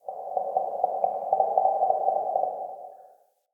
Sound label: Cave Creature; Caves; Glades Of Andurin